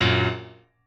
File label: piano